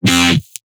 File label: BASS SHOT